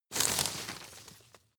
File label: rustle medium short; berufe